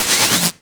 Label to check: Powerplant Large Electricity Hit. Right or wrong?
Right